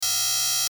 Error Buzz; Electrical Button; Multimedia; Hit, Electrical, Glitch, Production Element, Imaging Element, Accent, Transition; Electrical Buttons; Blastwave

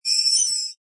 Sweeper Metallic Squeak; Scifi Production Elements, Set 2; Science Fiction Sound Effects; Lynne Music

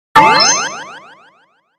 Hit Blip Chime Ascend; Blastwave

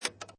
Pinball Sound Little Flip Or Switch; Video Games; Sound Effects:Games

